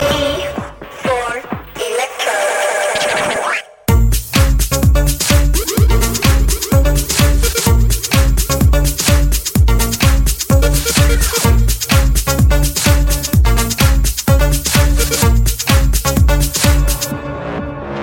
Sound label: electro